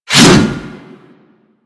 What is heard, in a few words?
Axe Swing; Warframe; Weapons